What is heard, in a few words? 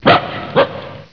DOG; ANIMAL Soundclips